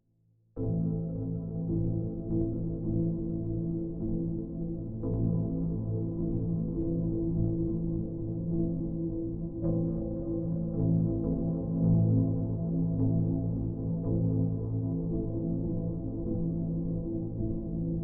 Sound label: Far Piano